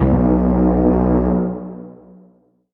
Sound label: horn; boss; goregrind